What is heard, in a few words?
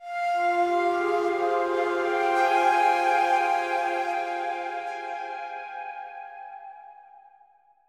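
Synth F minor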